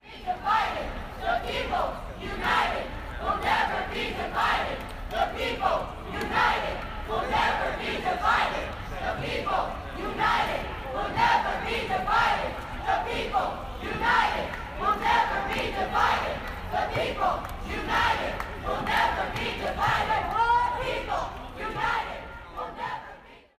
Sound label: chant